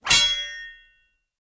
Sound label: sword tsing